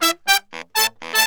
MERENGUE, World Grooves

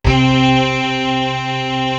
DISTRT, ORGANS; East West; Global Sounds

